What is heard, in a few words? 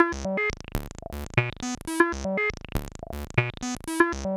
SERGE; AMG - Vince Clarke Lucky Bastard